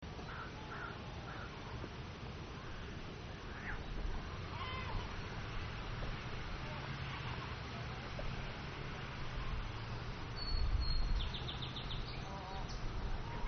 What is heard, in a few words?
country ambience